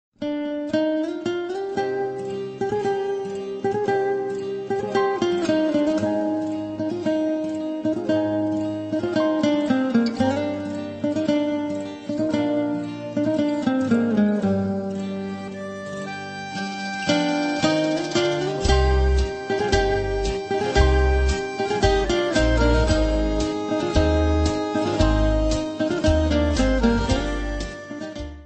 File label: guitar lovely ringtone; android